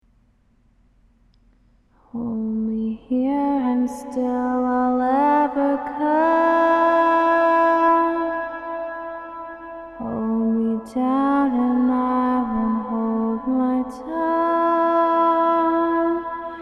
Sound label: ambient